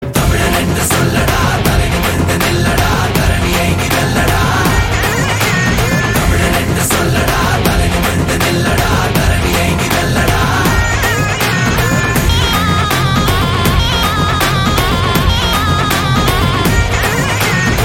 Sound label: Karaoke Ringtone; Bhoomi; Tamil